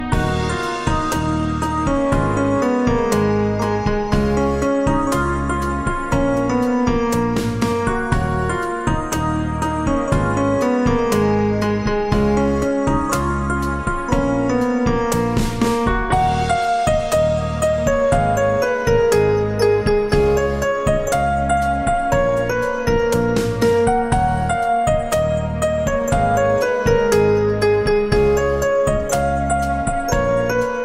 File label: Instrumental Ringtone